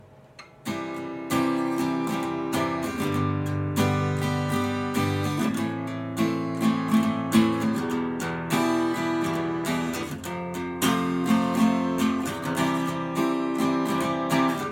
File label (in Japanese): 16ビート